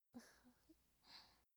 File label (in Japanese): 笑い声; ループボイス